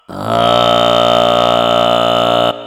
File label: Kargyraa